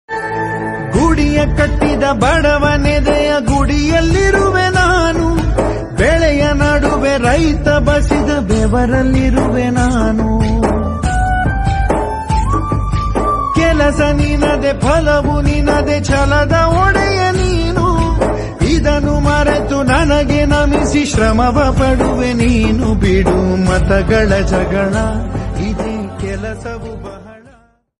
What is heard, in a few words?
kannada ringtone, feeling ringtone; upendra